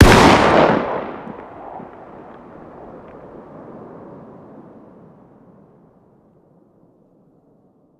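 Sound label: fire distant; gmod; arccw uc commons attachments; ub12ga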